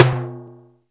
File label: timbale l, Percussion; SN-R8-01 Contemporary